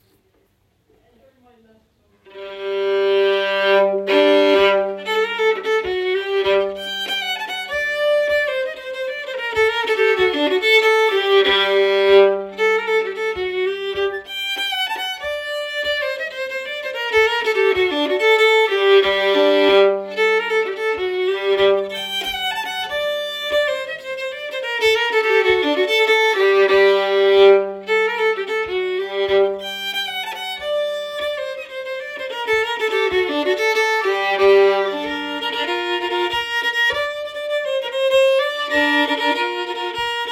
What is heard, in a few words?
Polska; Polska efter Jonas Dahl